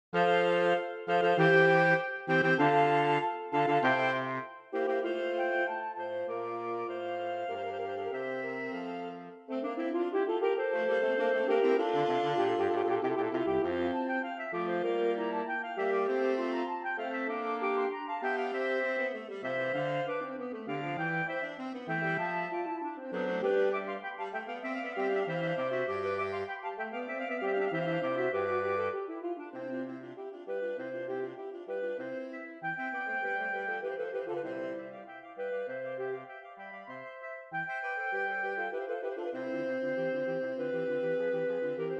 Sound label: Fantasia in F Allegro sax; Mozart